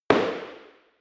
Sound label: impact hard indoors short